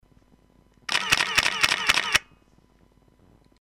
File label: X700 MD 5 times, camera